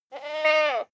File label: goat baa shy male